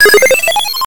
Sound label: Hidden Door; WonderBoy